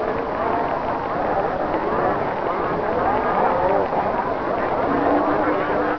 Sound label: crowd; hl2; roof top